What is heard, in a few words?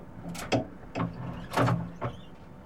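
twist and forward, train; OpenBVE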